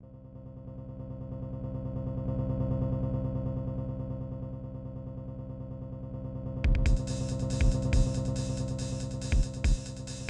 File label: Suspense; Phoenix Wright Ace Attorney; SNES Remasters